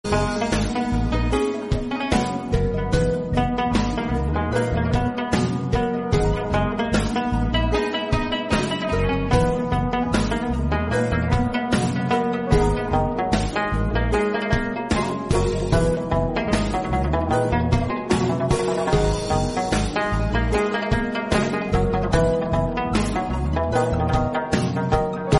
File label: lofi